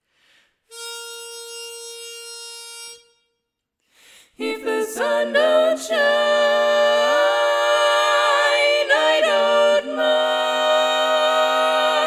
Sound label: tenor dom